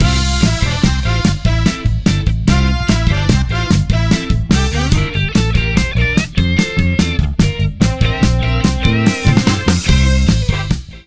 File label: Happy RB